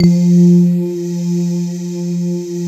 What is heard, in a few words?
SYN, Roland, digital